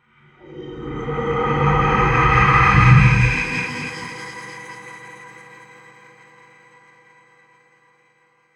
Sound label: Magic Ambience Air; David Dumais Audio; Air Magic 1